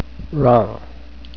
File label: wrong